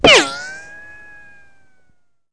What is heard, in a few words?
RICOCHET; REDNECK; RAMPAGER